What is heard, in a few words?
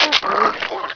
gib; Quake; PainKeep; player